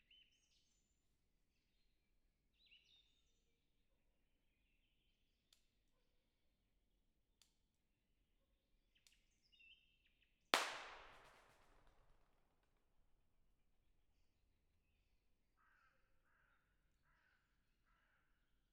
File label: walk in forest